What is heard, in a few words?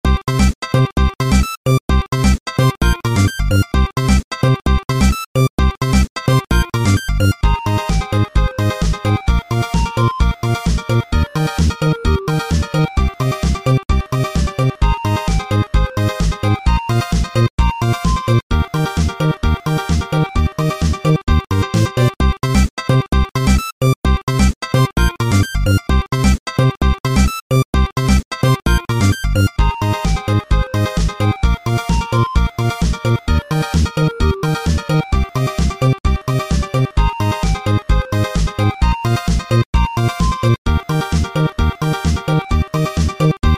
Cat Jingle; super cat tales 2